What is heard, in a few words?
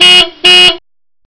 duda